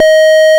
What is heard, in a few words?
Square D#4; Ableton Foundation FreePacks; Foundation Glitch Toys & LoFi